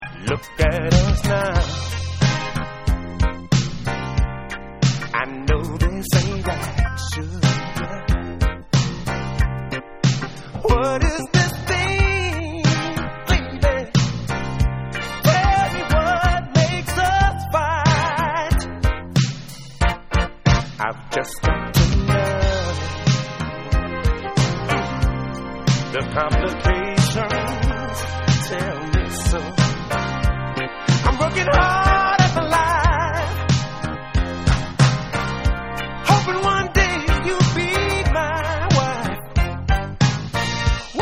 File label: Tenor Sax